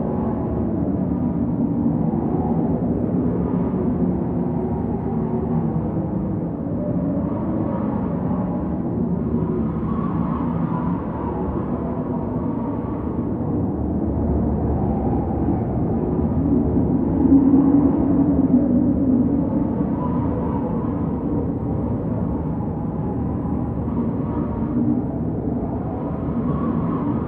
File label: Wind graveyard